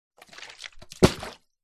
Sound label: bottle flip plastic water bottle throw flip land; zapsplat